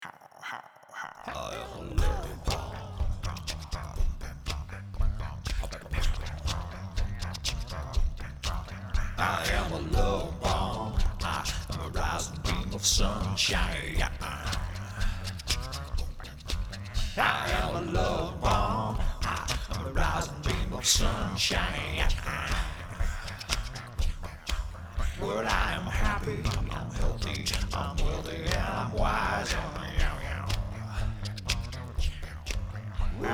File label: Love Bomb Mantra